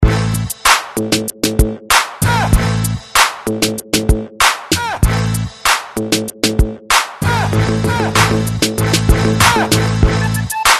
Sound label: Rap Ringtone